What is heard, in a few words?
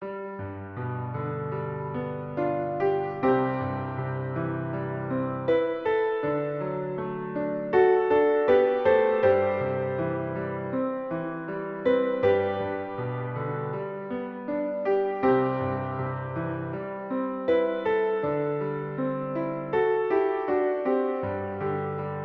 piano Ending